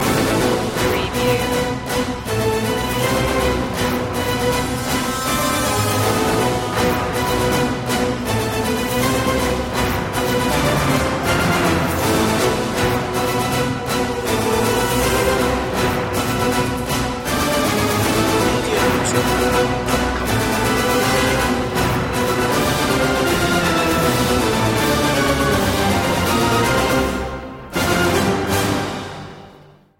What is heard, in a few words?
no choir